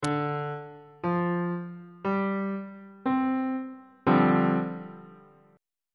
f double sharp dominant 7th suspended 4th 2nd inversion on bass clef